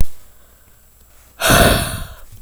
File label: sigh